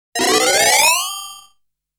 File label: Shield wear